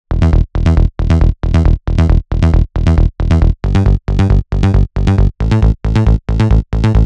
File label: Bassline E 136 BPM; Loops; Maestro Bassline